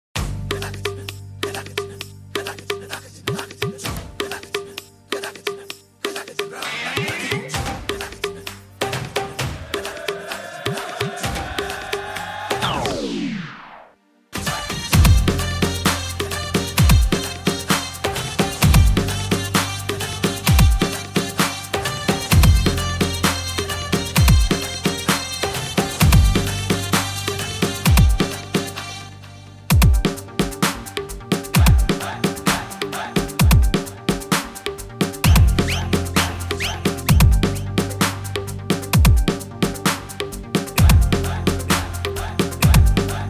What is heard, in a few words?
Clean Karaoke, 130BPM; Simmba; BasserMusic Studios